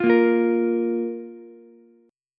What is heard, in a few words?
A sharp 5th A sharp, Ukulele; strummed; 10,10,13,13; chord; JGuitar